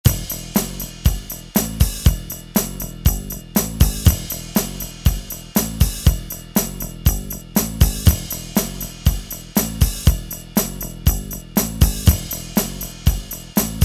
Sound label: Rock